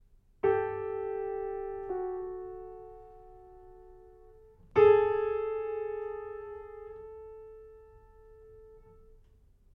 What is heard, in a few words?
crunch resolve